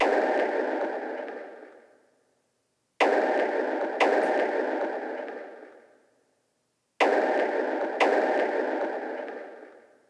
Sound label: heavy metal